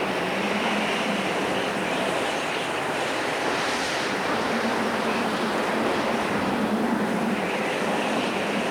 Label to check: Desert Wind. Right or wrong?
Right